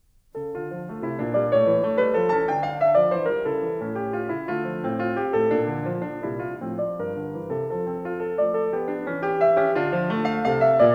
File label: Keyboard Suite; Handel; Keyboard Suite No3 HWV428; Heidsieck